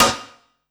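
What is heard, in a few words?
snare drum, Drum & Bass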